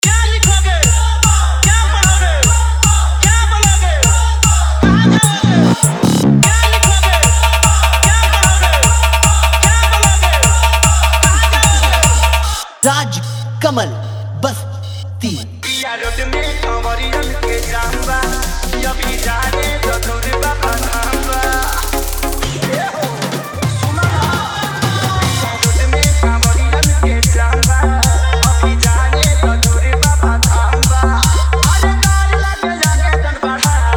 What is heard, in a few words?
New Trending Viral Bol Bam Dj Remix Song Hard EDM